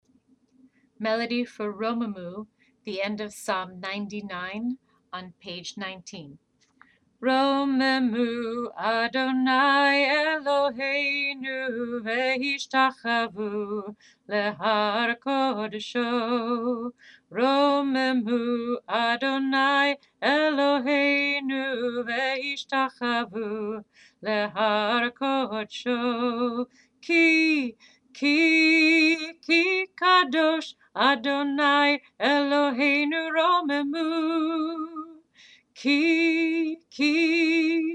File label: Romemu melody for band end of Psalm 99